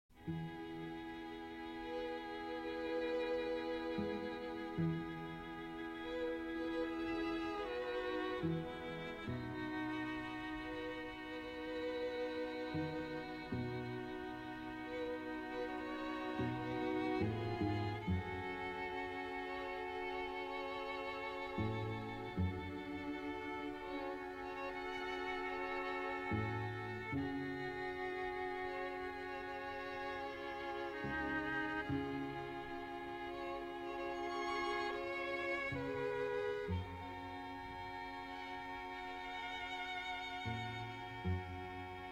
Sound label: String Quintet in C - Second Movement (opening); Carrington, 1995